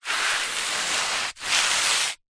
footstep single scrape scuff sandal concrete light dirt; foley; zapsplat